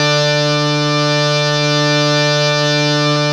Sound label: HARMONIUM, World Instruments; Best Service ProSamples vol.52 - World Instruments 2; AKAI